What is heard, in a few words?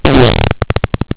fart